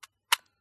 Smena Symbol camera wind lever locked; mkh8060; PMSFX

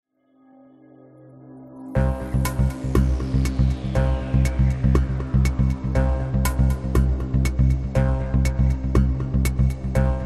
music quiz show tension bed build to end short

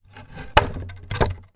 wood plank fall soft ground; foley; zapsplat

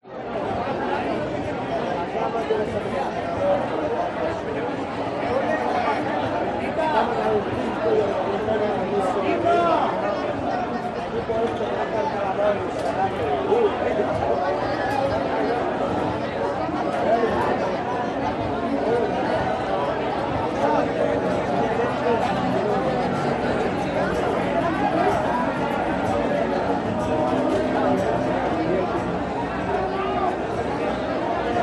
Spain Carnival; Audio Hero

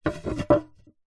wood slat pine set down solid ground; foley

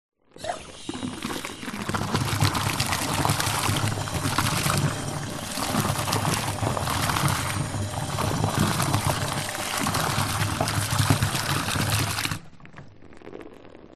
household bathtub tap run into water bubbles; zapsplat

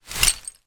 glass broken in plastic bag movement; zapsplat

